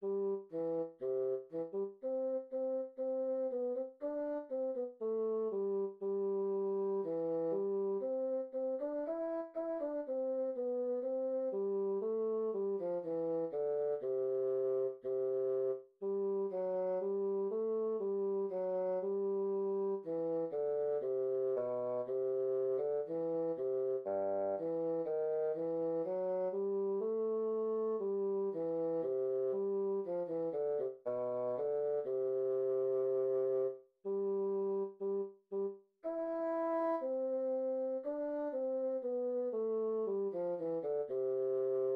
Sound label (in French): etude pour basson